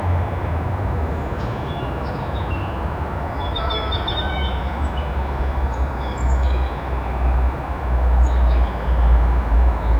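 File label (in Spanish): sonido de parque público; afternoon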